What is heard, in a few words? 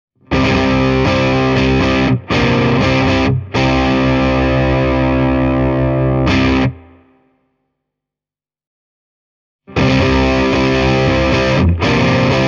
bluetone fried eye 22 reverb crunch channel plus boost options; Hamer USA Studio Custom -kitaralla; Crunch –> Crunch + FE Boost –> Crunch + FE Boost + FX Boost